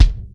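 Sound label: bass drum ambient; 300 Drum Machines; Yamaha Motif ES-Series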